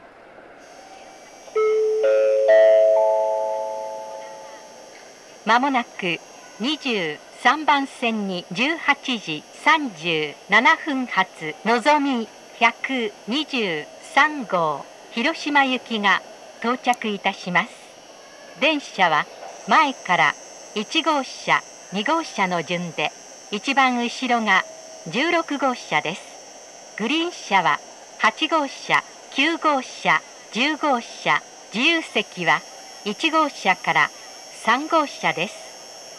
shinagawa nozomi hiroshima, jr-central; station

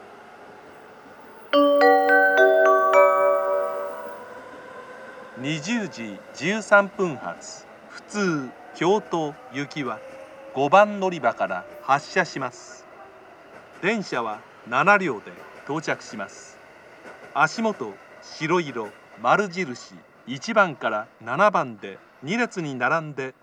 amagasaki local, station; jr-west; kyoto

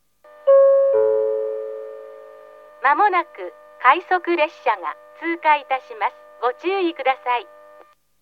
pass rapid, station; joetsu-international-skiing-ground; jr-east

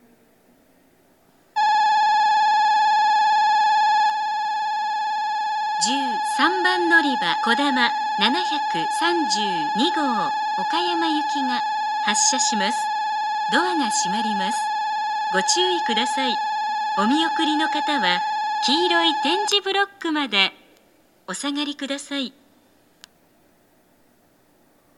kodama; こだま732号; 岡山; こだま732号 岡山行です。; hakata; station; okayama; jr-west

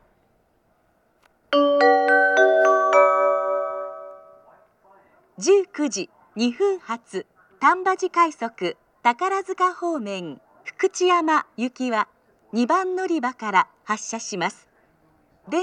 rapid; tambaji-rapid; fukuchiyama; jr-west; amagasaki

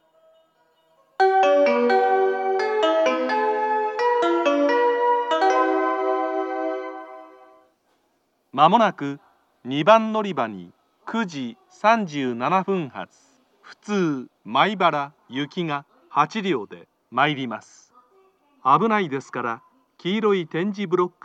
local, kyoto station; maibara; jr-west

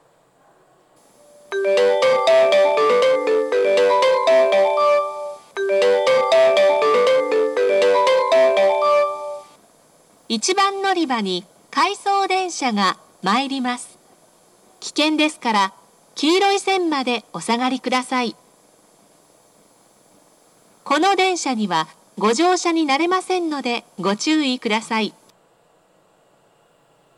out of service, station; ikoma

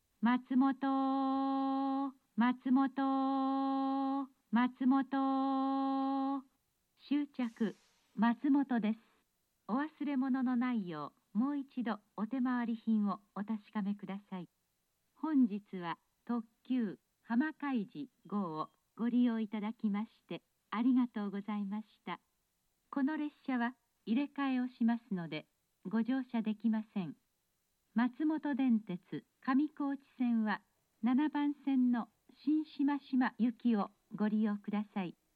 stop limited express yokohama, matsumoto; jr-east

